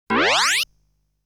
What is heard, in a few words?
Daemon Shot Sound; legend of hero tonma